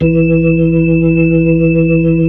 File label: B-3 Chorus